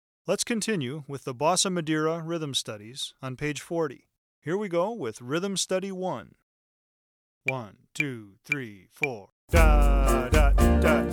bossa rhythm studies

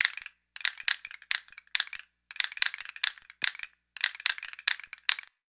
castanets

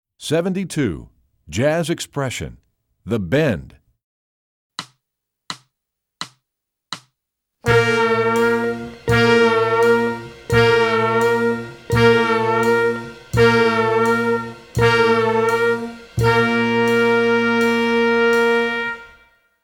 jazz expression bends scoop

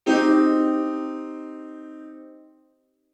c minor chord

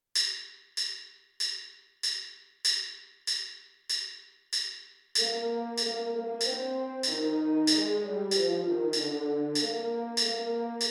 sawmill creek french horn

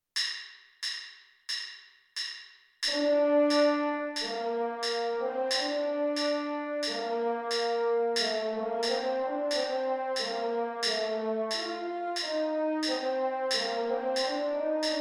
french horn; the conquering hero

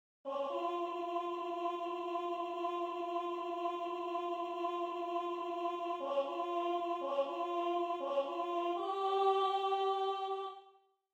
sopranos; bars 66 - end; fairy tale creatures

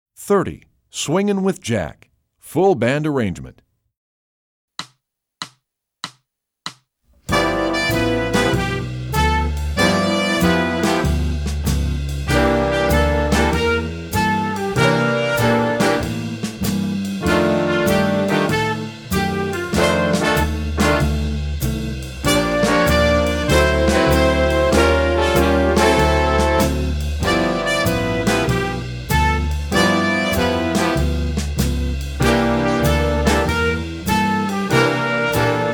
swingin with jack full band

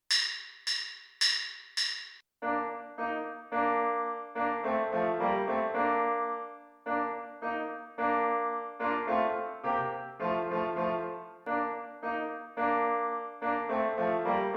finale for clarinet piano only

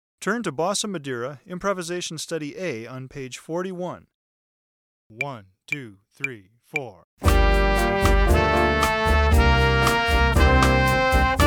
bossa improvisation

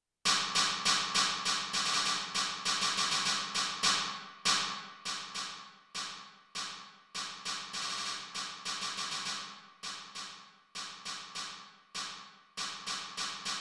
snare drum only; the royal drummer

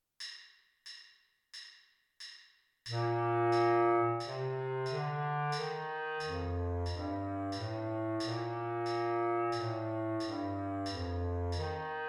c major scale bass clarinet

